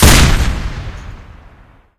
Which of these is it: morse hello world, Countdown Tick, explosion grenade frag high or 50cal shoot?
50cal shoot